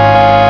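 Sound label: COCKROACH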